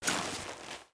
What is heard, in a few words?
Backpack Close; Metro Exodus